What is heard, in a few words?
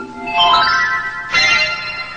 Zing